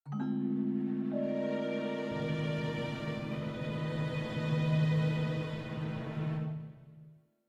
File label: Stinger Puzzling; Costume Quest 2